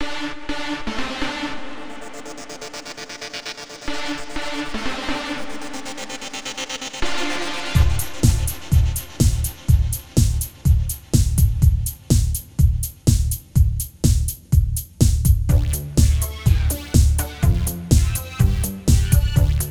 Singing fish; Fable Heroes

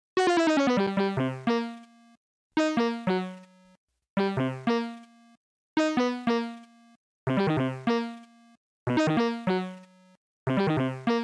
Melody; chippy

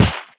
Ball Bounce From Height Sand Court

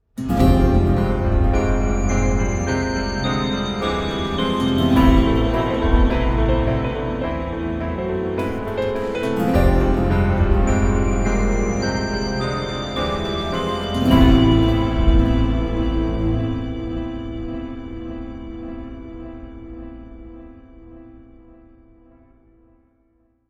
contemplative; Joe's Diner

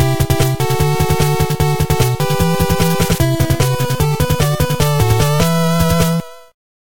Fanfare; treachery in beatdown city

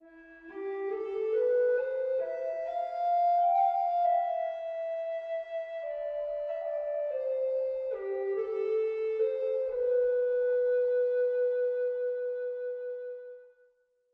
Market Front

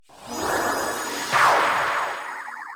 popping Soul Sequence; popslinger; ps4 ps5 switch windows xbox one xbox series xs

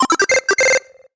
Solo Chip Square Positive; MUSIC EFFECT; startide

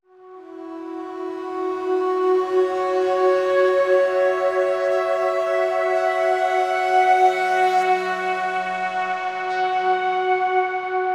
Synth F# Long; torchlight iii; ps4

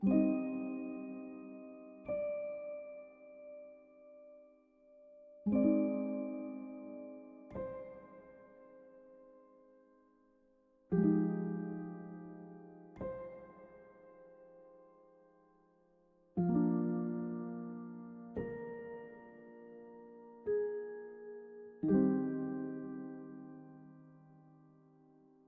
sparse melody

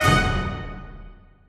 Train Miss; dragon mania legends

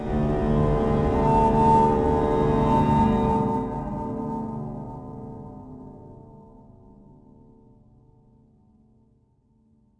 atmo church organ inside; Haunted

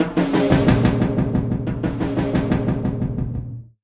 90 bpm beats; Torchlight III; PS4

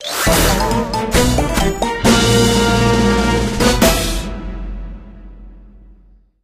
level Complete; Deadlings

